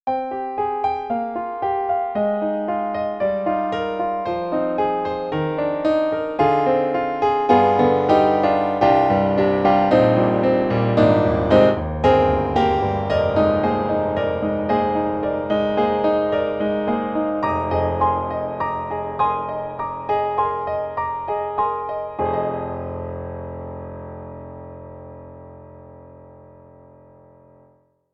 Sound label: Roland SRX-11 Rock Grand, XV-5050